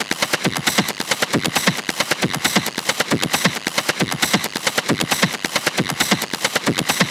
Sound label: Perc Loop 135, Techno; Ghosthack; Techno Sounds 2025